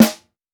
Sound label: Rock snare; Reference Beat